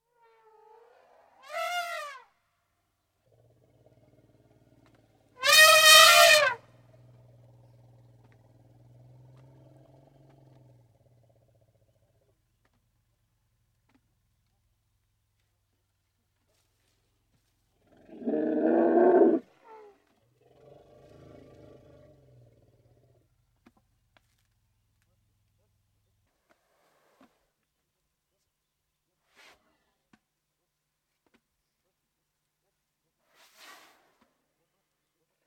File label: 2 Indian elephants growling and trumpeting, with some footsteps in undergrowth,chewing noises; BBC